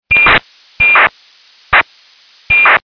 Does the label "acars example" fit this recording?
Yes